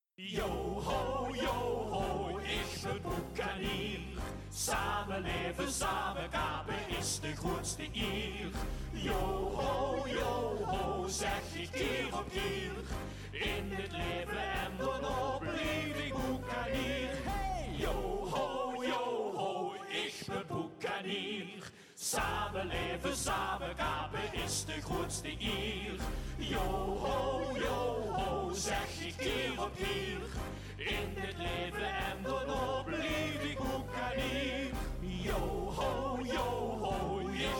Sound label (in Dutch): Boekanierslied refrein zacht